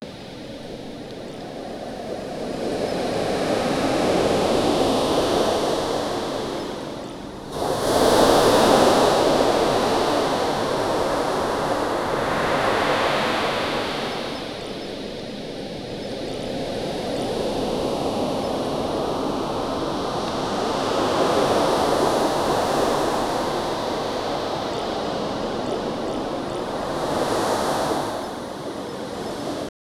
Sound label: ocean waves, wave crash; high quality ocean waves